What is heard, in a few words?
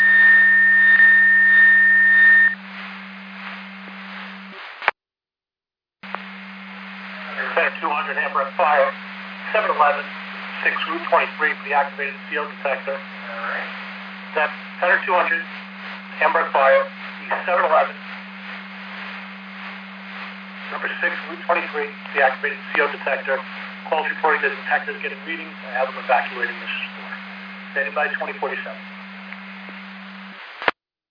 Siren; Hamburg FD